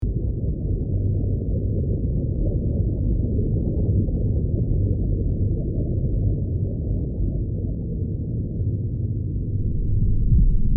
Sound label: Asteroid impact with space rumble; Sci-Fi Ambience; futuristic world